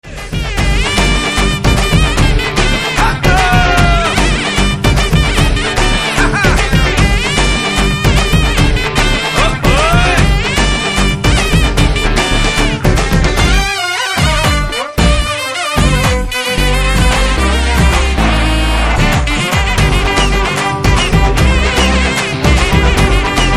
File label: BGM Drum Beats